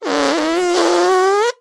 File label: fart